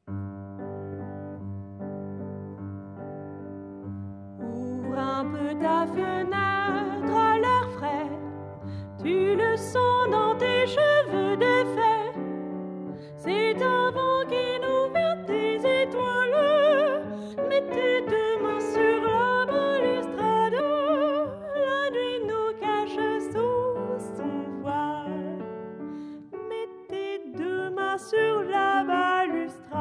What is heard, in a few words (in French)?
serenade piano chant